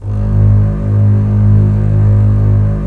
STR, Roland LA Composer

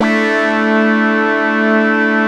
QUACK CHORD; InVision Interactive; Keith Emerson; The Most Dangerous Synth and Organ

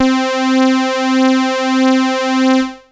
Techno leads, hits and riffs

